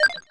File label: button